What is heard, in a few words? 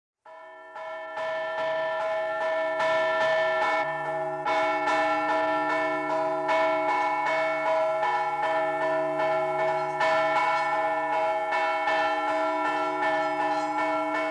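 zvuki kolokola, bell sounds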